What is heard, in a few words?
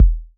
kick machine